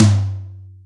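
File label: Ambo tom, Boss DR660, ht; drum machine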